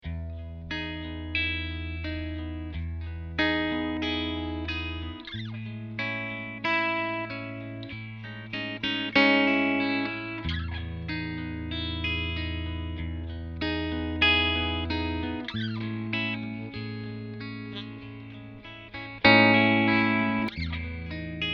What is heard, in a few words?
Jazzmaster pickups